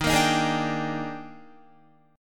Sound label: Eb Major 11th, Guitar; EbM11; strummed; JGuitar